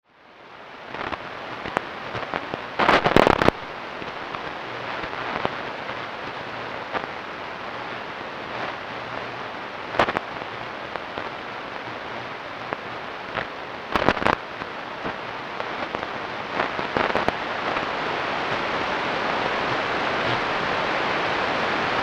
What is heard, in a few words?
22.21MHz SW; Ashcraft